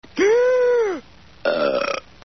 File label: burp; homer